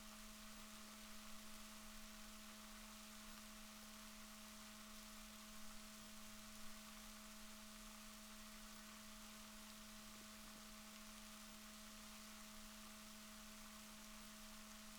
rorquals negatif, Balaenoptera Physalus Chile

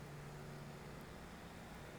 pulses, BALAENOPTERA; MONACO 2022-07

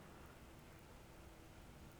pulses, BALAENOPTERA; MONACO 2022-07

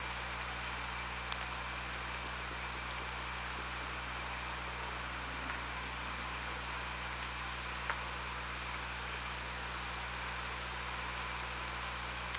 OrcaLab

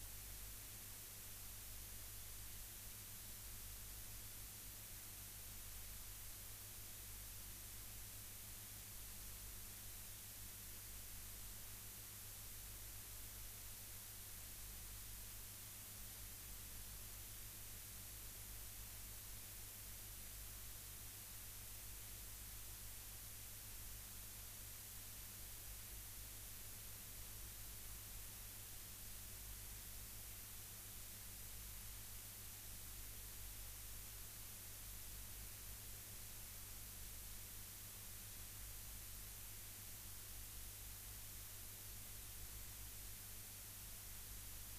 rayol farm; SEAMED

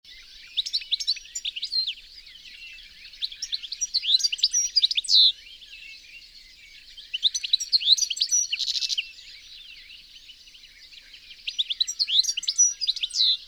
Carduelis carduelis; SETE

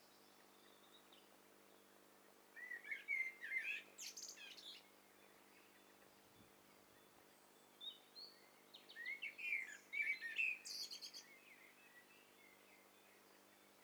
STEREO BIRD COCKTAIL PARTY

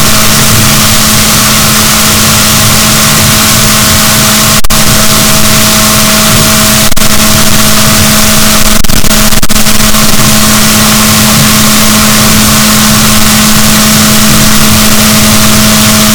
rorquals negatif, boat

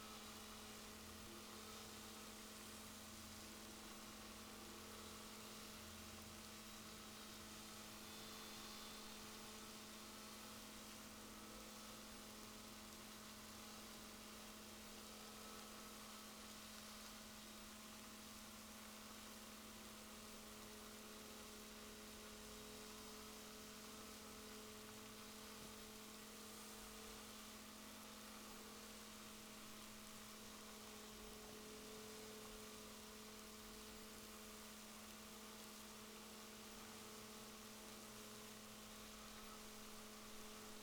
rorquals positif, Balaenoptera Physalus Chile

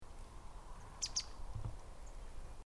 Poecile palustris; SETE

